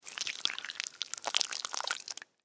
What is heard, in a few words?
Worm, Crawl, Movement, Slimy, Wet, Insect, Bug; Worms; Sound Effects:Animals:Insects; Blastwave